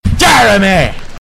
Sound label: notification sound